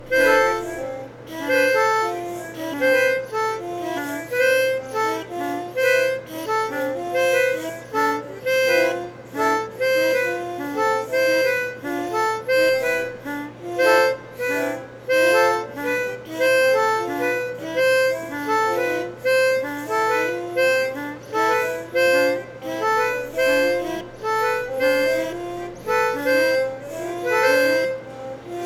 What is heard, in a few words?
pitch pipe pendulum